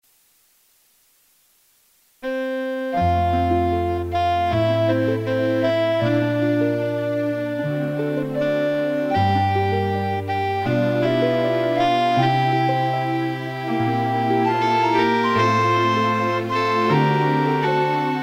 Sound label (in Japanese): synthe